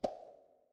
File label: ui pop